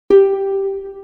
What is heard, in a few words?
pitch tone